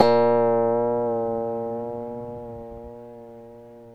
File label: ASI KOTO A#1, Yamaha VP-1, ASIA-KOTO; Music Store; 90's Sample CDs